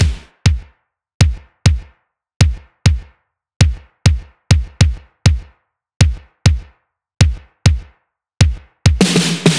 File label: 100 Beat Simple Digital; Zero-G; Future Wave